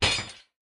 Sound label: Drop Metal Object